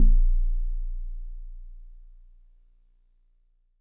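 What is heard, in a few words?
Warble Celeste DX100 A#0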